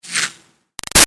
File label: Arrow By Ruffles Fast; Bow And Arrow Sound Effects; Bows and Arrows; Combat